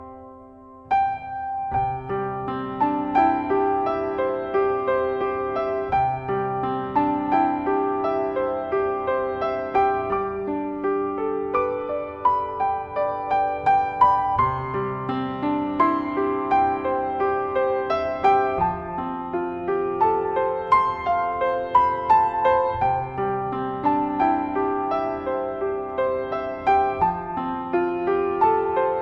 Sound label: silent night instr